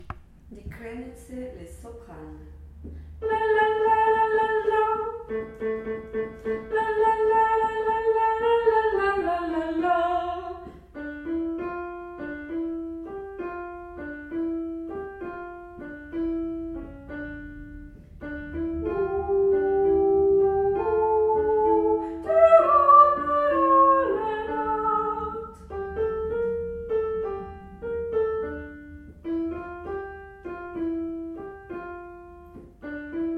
di krenitse soprani

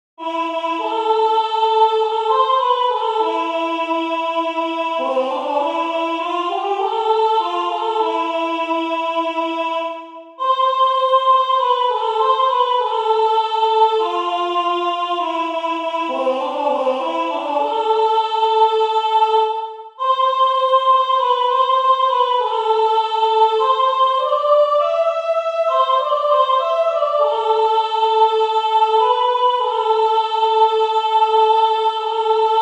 mon todam vaoesh ko soprano voix

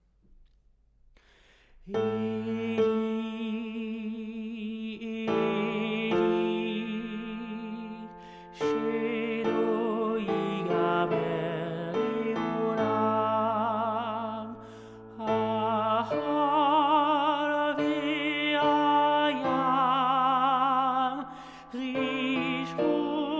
eli eli sopranes

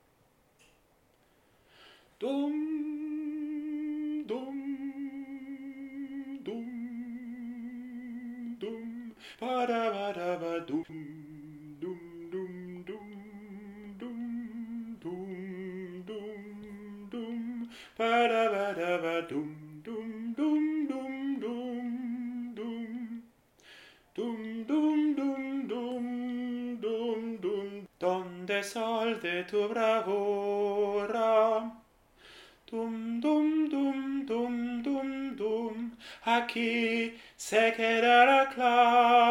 tenor

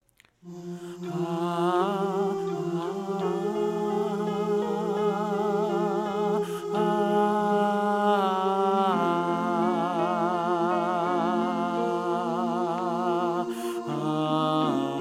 darogoi sopranes

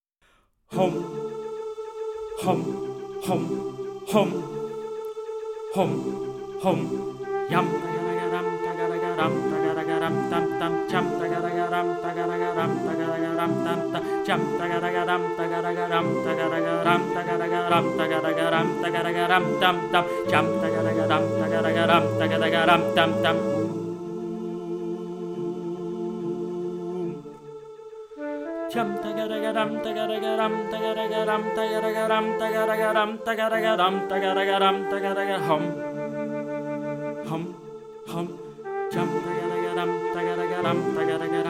altos session

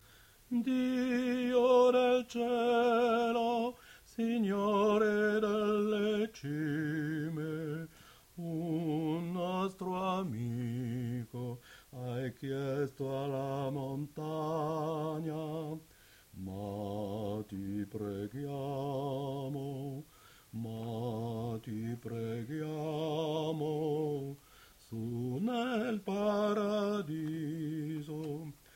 basse

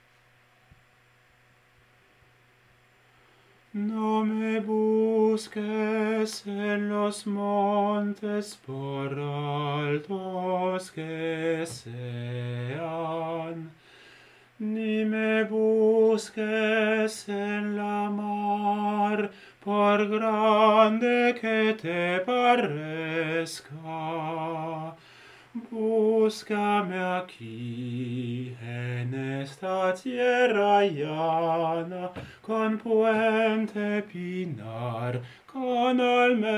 esta tierra basse

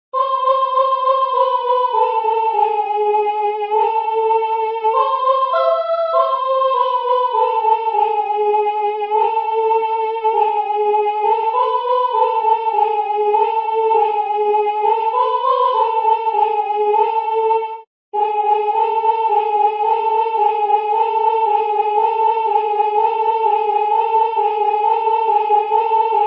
soprane

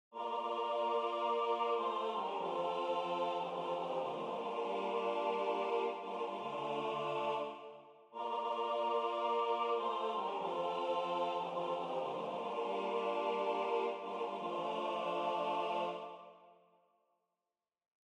na nda tutti

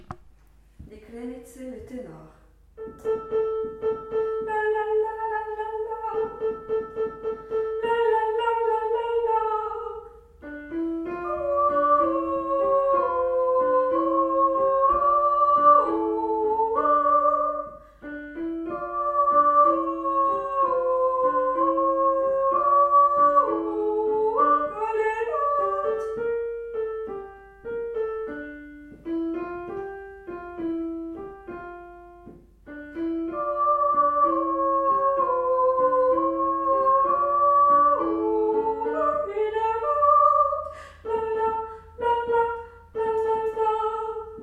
di krenitse tenors

